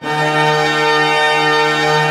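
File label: pentatonic A fortissimo, orchestra; Sound On Sound; Peter Siedleczek's Orchestra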